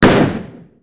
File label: magnum shot